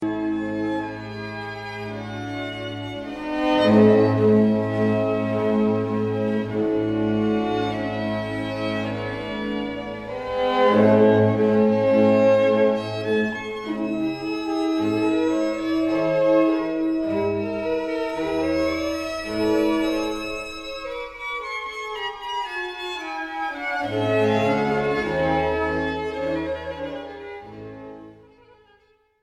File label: Violin Concerto No. 4 in D Andante cantabile; Mozart